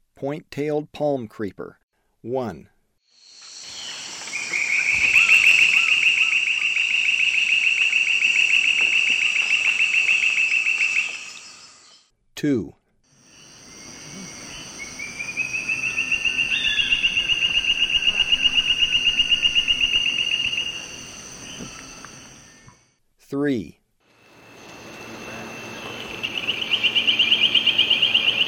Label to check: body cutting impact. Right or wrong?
Wrong